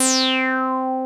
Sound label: 303 REZ; Pro-Rec - Synthsonic